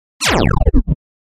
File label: Laser Lfe Laser Wash